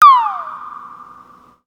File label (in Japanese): コメディ